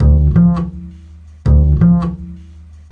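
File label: acoustic bass minor up; bass loops; melodic loops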